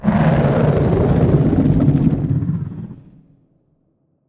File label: death; Barotrauma; Endworm